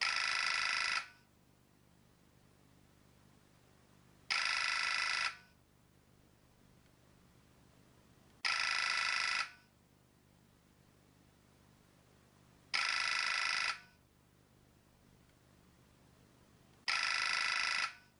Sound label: nokia 1200 reed ringtone